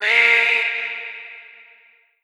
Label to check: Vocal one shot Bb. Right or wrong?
Right